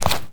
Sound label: Mud footsteps; SNKRX